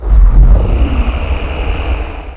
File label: Gate Ship In; SWARM